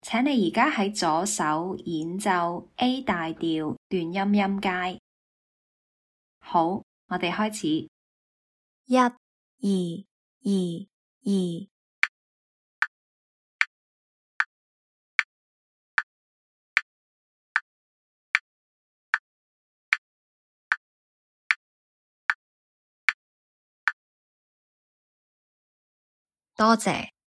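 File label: A major staccato scale left hand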